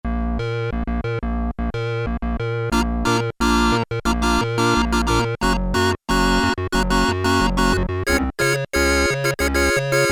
New Wave Organ, Korg Poly-61M

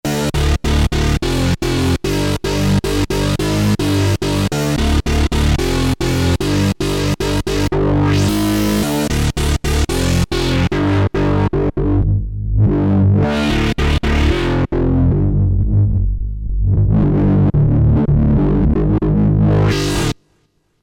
Nasty Bass, Novation XioSynth; Novation XioSynth 25

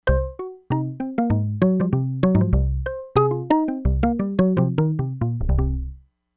MALLET HARMONIC + KNOCK ON WOOD, Crumar Bit 99; Factory Programs

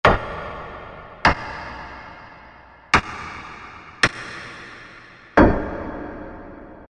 Hammer, Korg M1; Factory preset examples

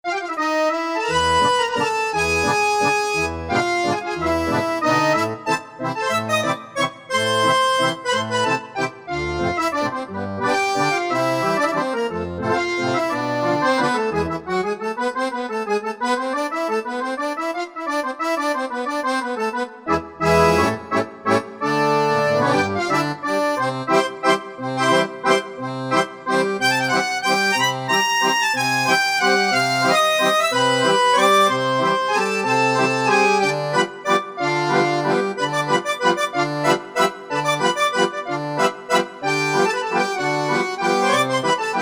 Accordeon

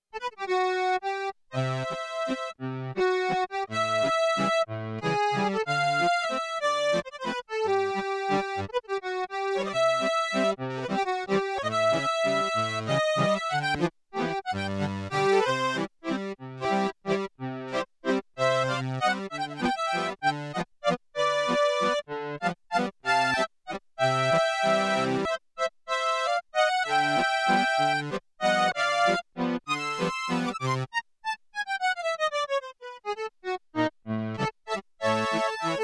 MUSETTE, SIEL DK 80; Factory

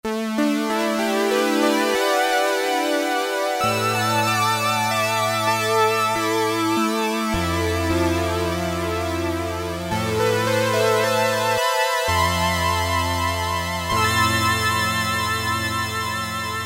Space Harp, Roland Juno-60; Factory presets

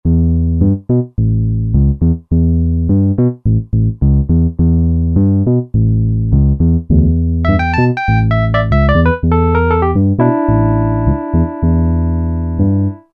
Pno Bs, Roland SR-JV80-08; '60s & '70s

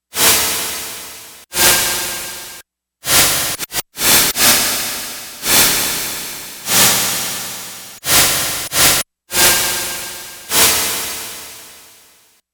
White Blow, Yamaha DX11; Factory Preset Voices

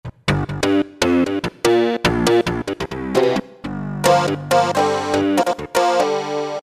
Painful Clav; Roland PN-D50-02

